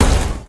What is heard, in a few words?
fail; Habegger loader game; pygbag